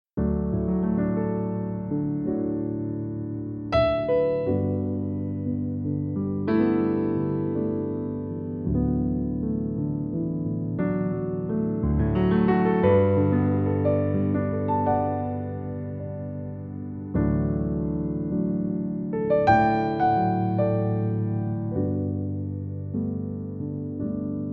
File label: Piano Version